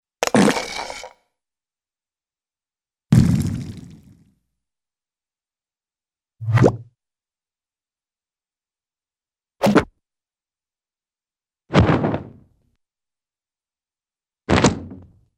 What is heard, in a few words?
Various Impacts - Parachute Open - Rug Shook Out, cartoon; Hollywood Edge; Cartoon Trax